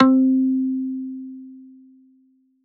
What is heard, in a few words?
EGuitar Pluck C3; Aubit; Awake Vol. 1 Serum Noises